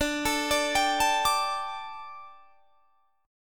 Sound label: D Suspended 4th D; arpeggiated; 22,24,24,24,22,22